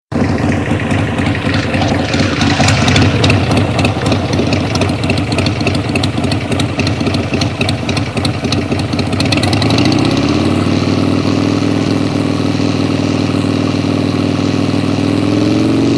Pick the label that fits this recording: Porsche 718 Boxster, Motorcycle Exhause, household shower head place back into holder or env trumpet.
Motorcycle Exhause